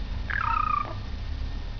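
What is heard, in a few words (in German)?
triller, wellensittich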